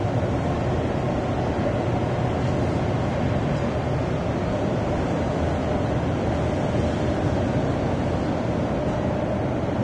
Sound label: plaza amb, ambient atmosphere